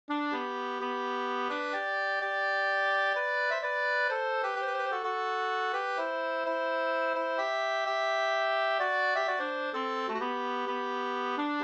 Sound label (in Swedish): hamburska